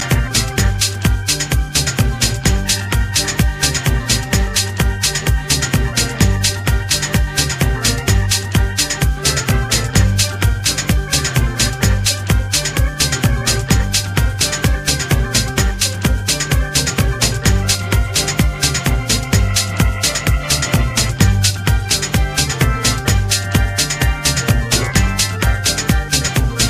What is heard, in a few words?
Children Go Round Inst